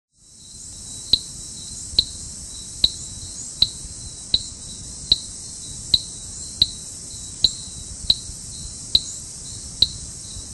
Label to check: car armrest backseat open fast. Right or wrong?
Wrong